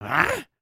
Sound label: Stunned, creatures organic